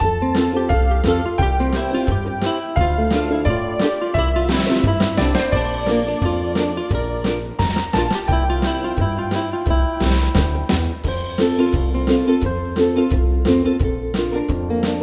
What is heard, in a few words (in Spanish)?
Karaoke